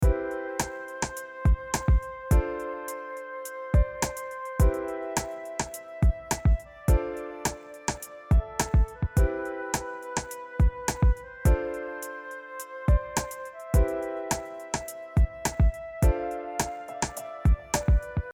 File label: MIDI Flute